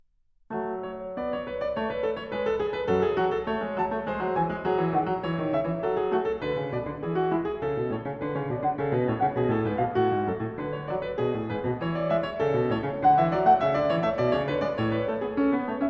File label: Prelude No. 14 in F-sharp minor; Well-Tempered Clavier; BWV 859; Bach; Book 1